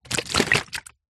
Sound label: food drink coffee in disposable cup shake movement; zapsplat